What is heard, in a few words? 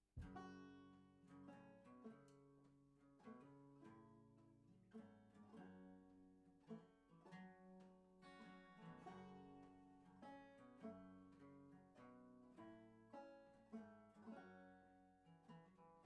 Bass Mic